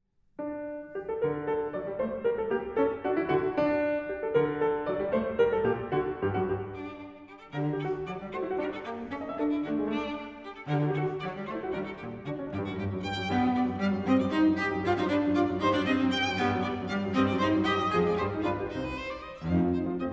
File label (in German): Klavier Trio